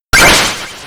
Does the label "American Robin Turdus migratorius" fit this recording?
No